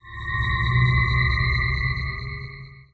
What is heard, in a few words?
psi attack; SMACDEMO